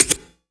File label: C5, synth line; 90 s Sample CDs; NiceBeats; SynthLine VOL-3